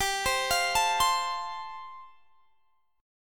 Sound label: G 7th Suspended 2nd Suspended 4th, Guitar Standard; G7sus2sus4 arpeggiated